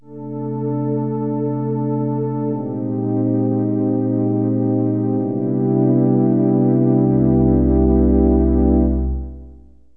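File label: warm pad; Roland; An Invitation To The Roland World Of Music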